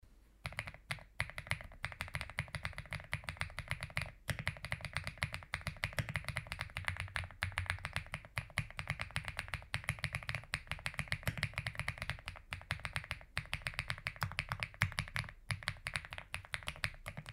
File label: kiiboom; kiiboom moonshadow v2